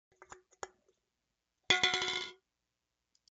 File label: can Fall; GameplaySequenceDemo